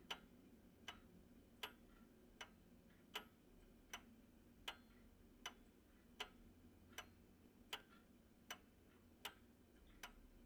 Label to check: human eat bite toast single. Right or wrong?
Wrong